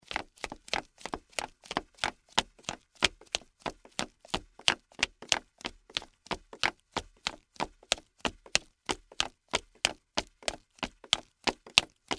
High Heels Run, Footsteps, High, Heels, Run, Concrete; Human; Blast Wave